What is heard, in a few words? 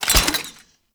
Sniper Alt Fire Mech React; DE; Warframe; Frumentarius; Exalted Sniper